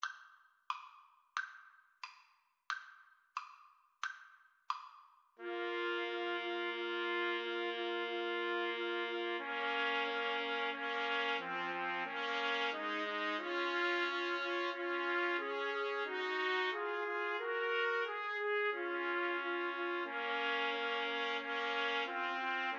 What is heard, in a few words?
pavane 3TPT, Trumpet Trio